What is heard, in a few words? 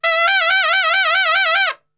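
E trumpet shake; Best Service; Brass Super Section; TRP SHAKE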